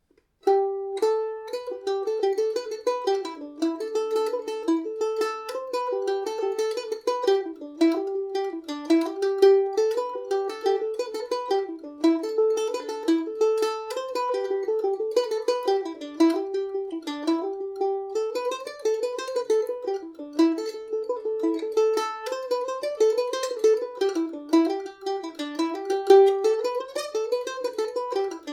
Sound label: G, Irish mandolin; leg of the duck